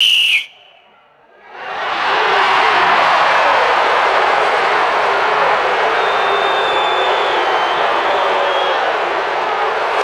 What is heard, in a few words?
large stadium crowd booing and jeering